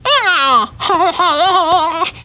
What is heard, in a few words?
Whimper; Nibbler; futurama worlds of tomorrow